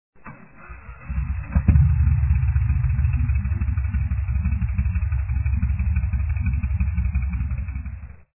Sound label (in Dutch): stationair, moto; geluidsfragmenten